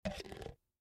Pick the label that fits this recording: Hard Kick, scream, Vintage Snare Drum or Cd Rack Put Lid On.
Cd Rack Put Lid On